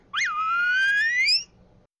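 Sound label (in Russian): свист мышиного короля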